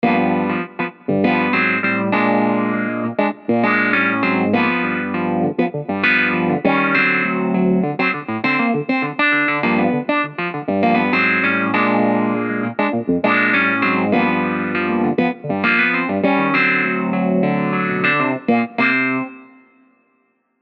Pianet smooth funk

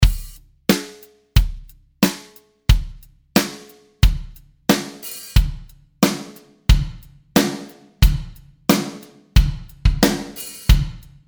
dry drums to reverb blend

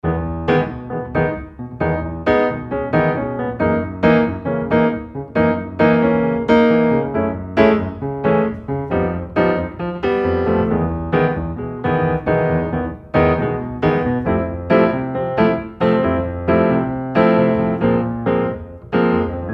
Bossa dry with detail knob up

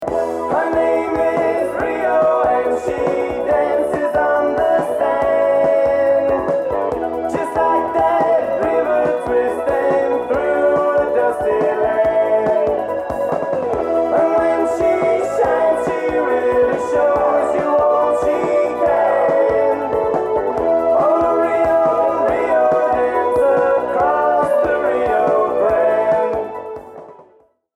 Vocal Isolate; EQ8

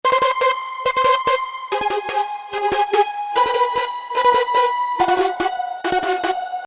hi synth

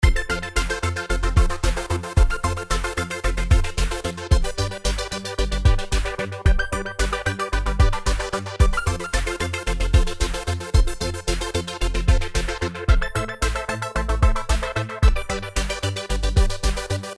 Easy Dance with Synth Layers; Electra

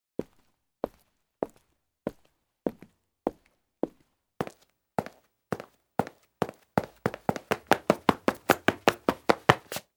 Mans Shoe; ASPHALT

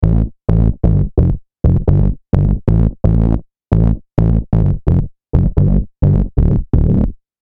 bass Fuzz Bass

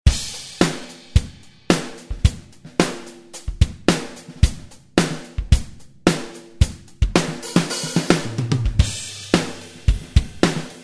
ROCK GROOVE; AVATAR

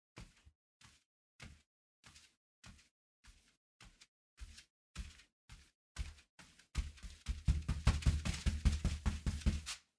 CARPET Bare Feet

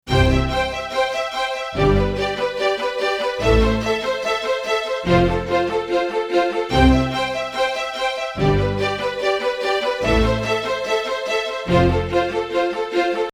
strings, stereo

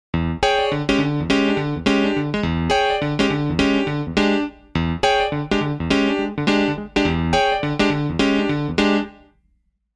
Electric Grand Chorus Grand

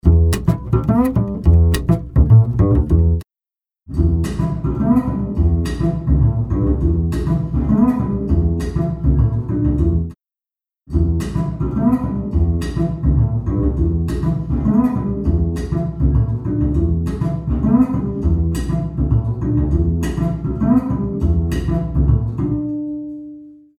DRY BASS ROOM BASS ALTIVERB BASS

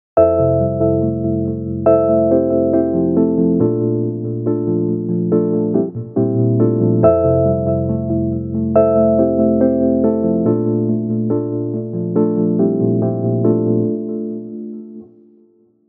Wurly Ballad Low Dynamics

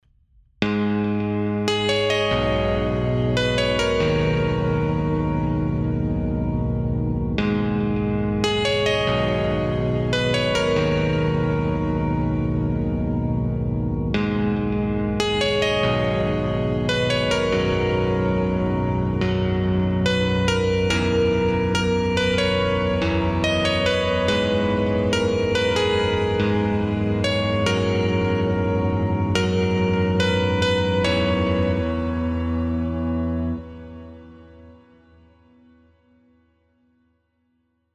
Electric Grand; Holdsworthy

